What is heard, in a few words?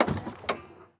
Chrysler 300M Hood Open, Automobiles